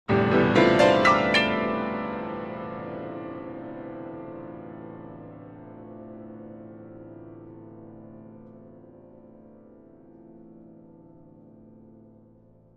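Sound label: Grand Piano Ascending Chords; keyboards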